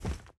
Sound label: Walk; portal quest; android